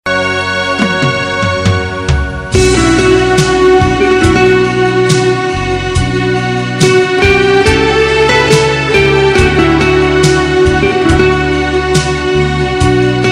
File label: Romance